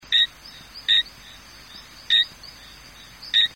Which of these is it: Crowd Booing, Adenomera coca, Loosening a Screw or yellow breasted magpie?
Adenomera coca